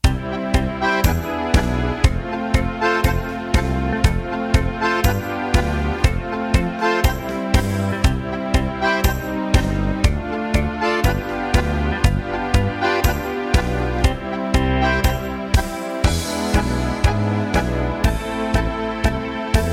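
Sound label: Italian Tango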